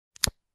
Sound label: Lighter switched on